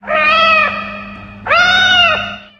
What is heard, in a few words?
cat, outdoor ambient